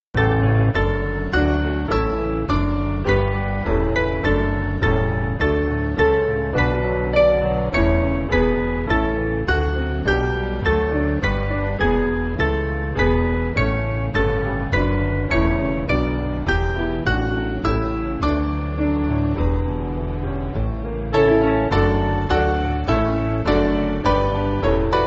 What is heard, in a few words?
Piano, Music Backing Files for Churches; Mainly Piano (CM)